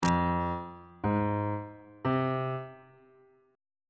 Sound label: f minor chord 1 is f minor on bass clef